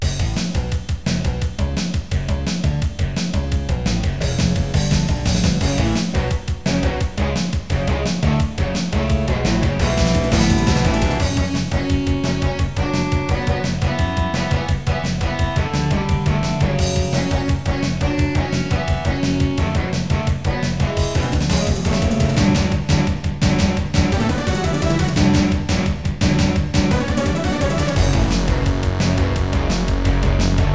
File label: Yamaha DS-XG